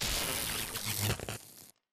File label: arc titan sizzle; Titanfall 2